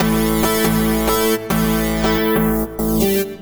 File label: ELECTRO SYNTH 140 A; Keys